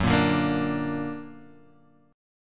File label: Bb Augmented Gb